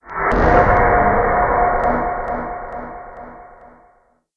button

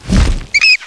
bat idl; eqclassic

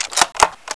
sniper bolt; weapons

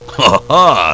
huhah

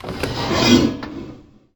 button click release

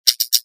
wires pickup

